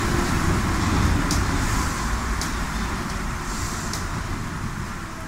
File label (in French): arret, Metro